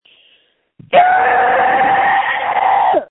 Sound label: screams